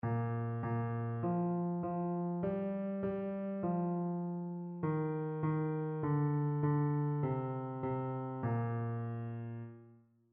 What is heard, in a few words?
mode identification